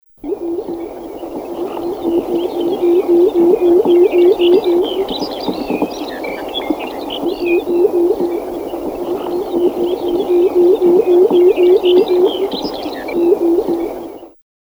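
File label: pectoral sandpiper; Dutysh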